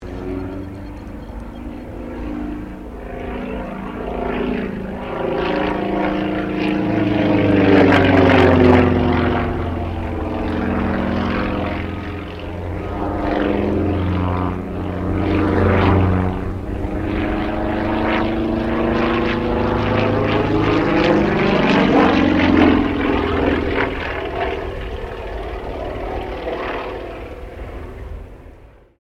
AIRCRAFT SUKHOI SU-26M LOOP, SU-26m Acrobatic aircraft; Airplanes